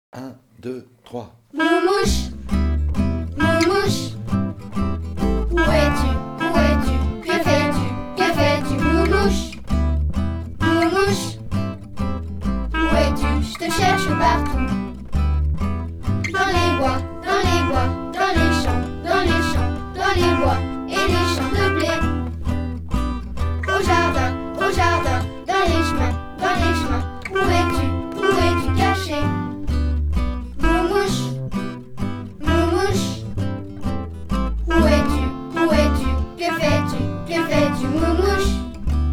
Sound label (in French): Je te cherche partout SONG